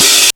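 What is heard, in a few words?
DRUMTRAKS open hi-hat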